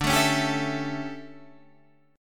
D Major 7th Suspended 2nd Suspended 4th D down Guitar Standard; DM7sus2sus4; strummed; JGuitar